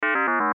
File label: Sci Fi Beep Electric, Sci Fi Beep Electronic Tone Signal; Tech; Blastwave